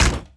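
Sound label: droid land medium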